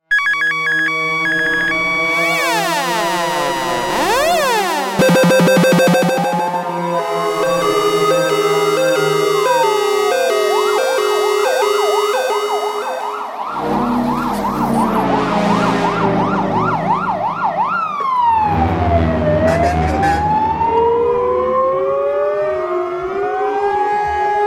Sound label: alarms and sirens